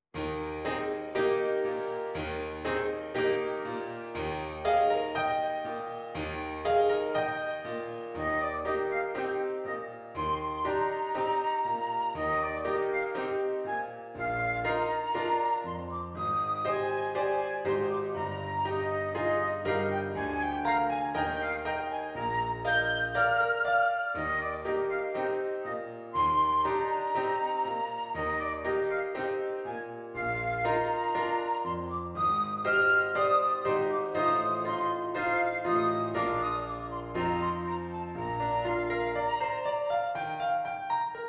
Flute

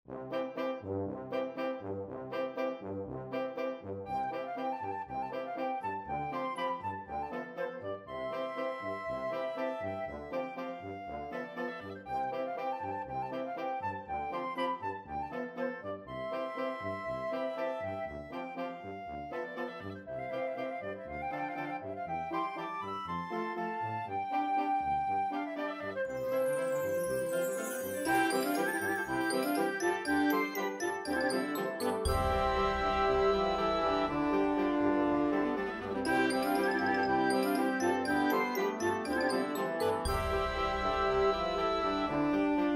BUTTERFLIES IN SPRING for band

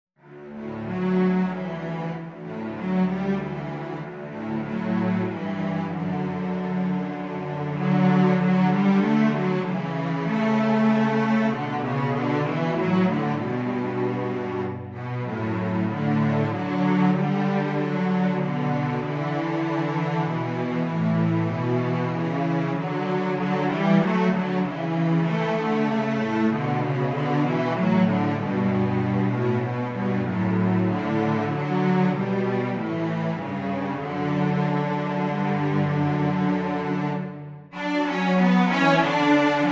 Cello, cello duet

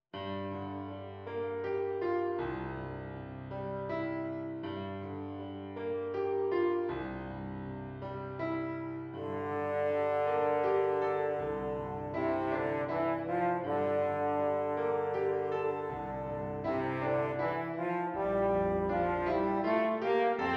Beautiful Horn Dream, french horn

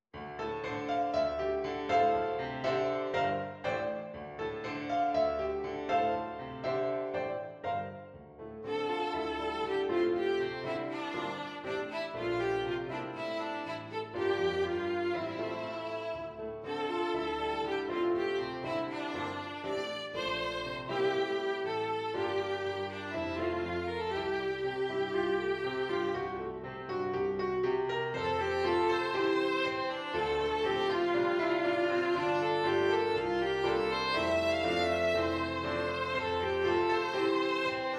Violin

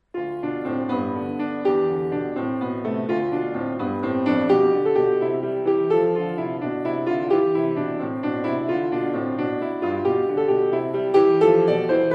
Piano Solo

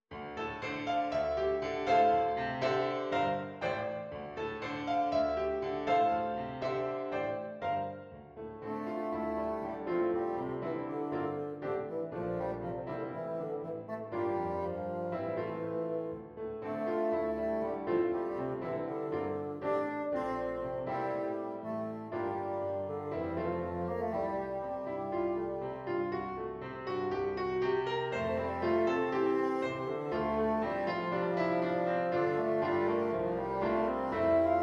Bassoon

